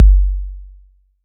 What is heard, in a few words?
BD 808